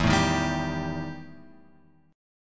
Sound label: E Minor Major 7th Double Flat 5th E; EmM7bb5; {0 0 1 0 X 3} strummed